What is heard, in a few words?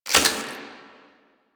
Quick Draw Mech; Warframe; The New War; Quests; Weapons; Omicrus